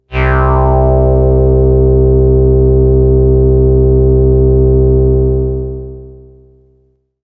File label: Sun Sigh B, synthpad